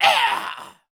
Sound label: fall; monster; barbarian soldier